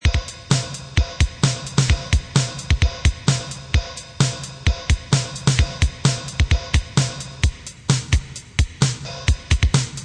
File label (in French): Style Rock, batterie; Cours de batterie; Exercices